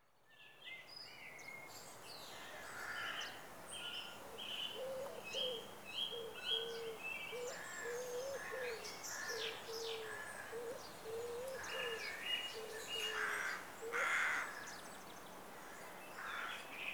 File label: Village Late Spring, Evening; Rural Soundscapes; BBC Sound Effects Library